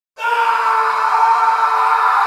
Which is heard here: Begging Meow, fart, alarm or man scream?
man scream